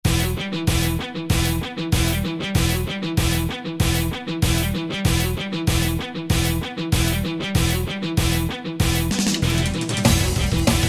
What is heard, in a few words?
Thrash Metal; Preset Styles